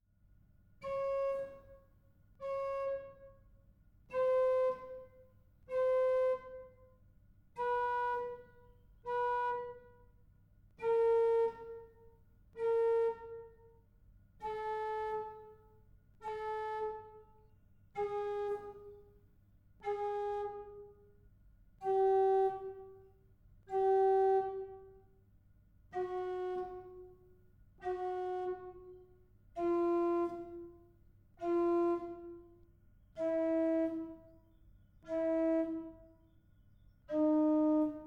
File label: Velocity Test, organ; duurswoude